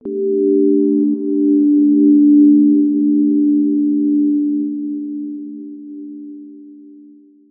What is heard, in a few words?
window attention